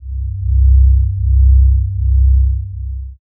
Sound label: space drone